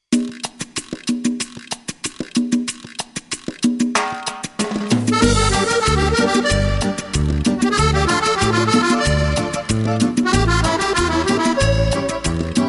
On veut danser la Cumbia

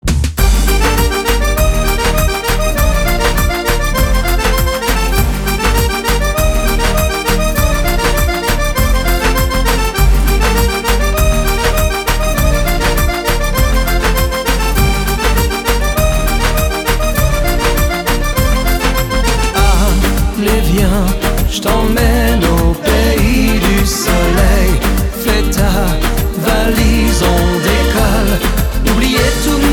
Le Reggaeton

